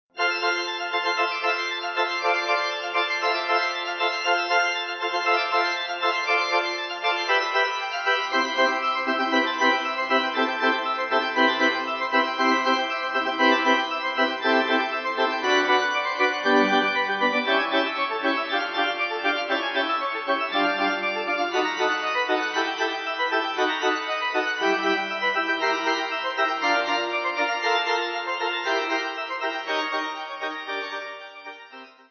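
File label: Toccata, pipe organ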